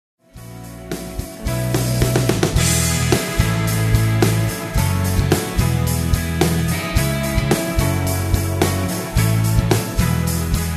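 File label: karaoke instrumental; princess; disney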